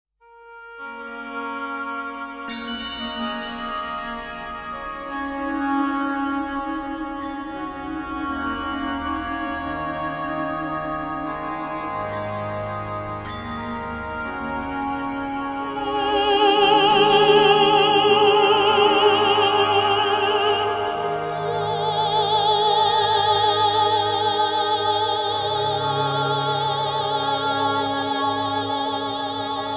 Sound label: Female Choir